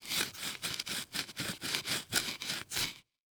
Wood Stake Twisting In Dirt; Sports Stake

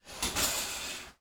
Household Shower Curtain Open

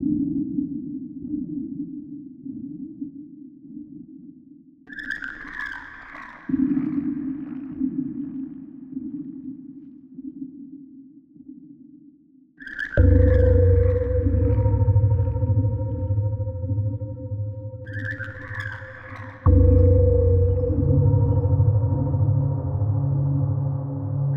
Creep Soundscape 74BPM; Soundscapes

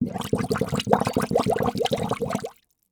Liquid Water Bubbling In Metal Bowl Fast Long

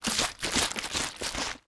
Liquid Water Water In Plastic Bottle Shaking

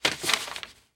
Foley Paper Rustle Short

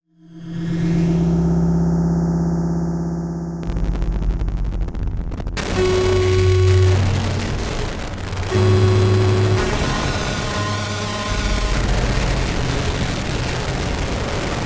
Distortion Static Horns; Module Production Element Title Imaging

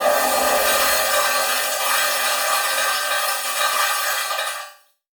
Transition Cymbal Element; Production Element Title Transition

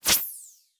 Multimedia Internet CD-Rom Flash Element

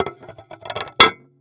Dishes Stack Ceramic; household

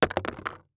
Impact Coins Multiple Coins Drop On Cardboard; Impacts

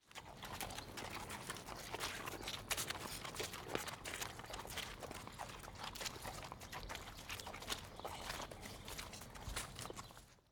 Animal Horse Walk In Mud; animals; AdobeCC

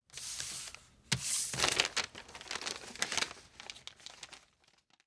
Foley Paper Slide And Grab

